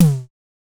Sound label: DSM2 Tom; MPC DSM-2